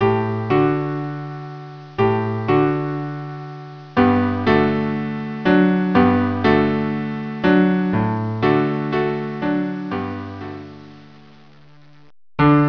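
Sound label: cello piano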